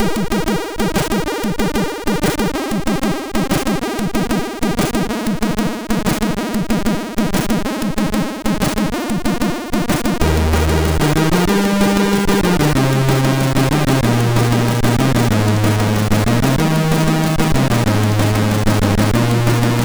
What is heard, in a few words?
sid MOS6581R2; Cavalcade